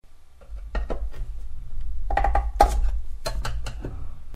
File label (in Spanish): abrir lata